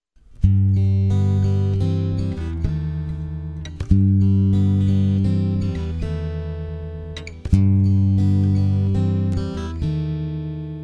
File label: Solo